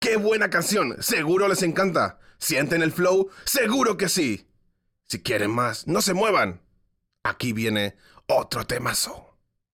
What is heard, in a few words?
Music Vehicle Radio Events